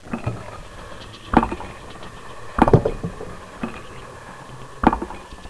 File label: big move, plats